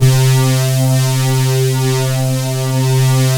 PAD C4, STRING; GREAT; Q Up Arts; Dream Experience

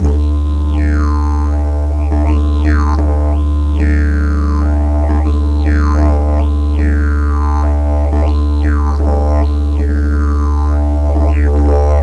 Didjeridoo 80 D; Sonic Foundry; Hip-Hop Street Beats Construction Kit; Ethnicity

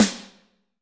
Snr, Alesis D4; Crker Box; 300 Drum Machines

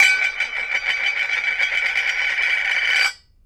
CAN CAP, KITCHEN; AKAI S-Series CD-ROM Sound Library VOL-6

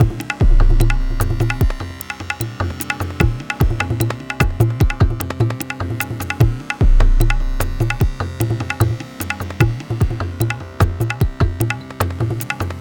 Tablatron, Processed Percussion

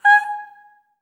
STACC G#5, Symphony of Voices, SOP STACC

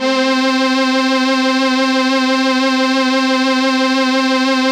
SYN, ENSEMBLE

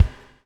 BUBL, BUBBLE; Spectrasonics - Backbeat

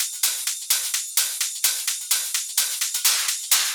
Loops 128BPM, Drumloops

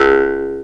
C# JAW, Primitive Instruments

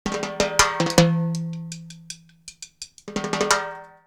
Live Perc Riffs; Jungle Frenzy 1

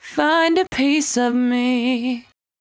74-5bpm B, Velvet Vocal; While U Could; Zero-G

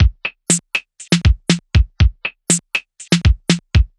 Hard Limit Beat; Sonic Foundry (Sony Creative Software); Myths of Technology; Composite Beats

